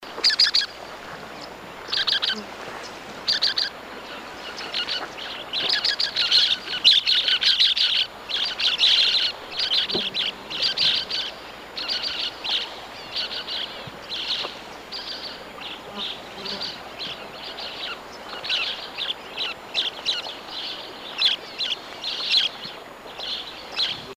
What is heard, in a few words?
mountain parakeet